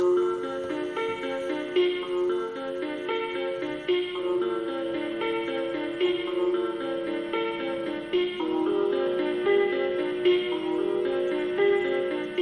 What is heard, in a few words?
automatic baby rocker